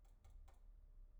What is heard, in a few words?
mouse; IEEE AASP